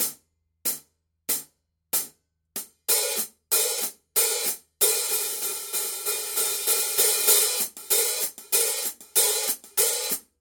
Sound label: signature dark energy 14 dark energy hats mark i pattern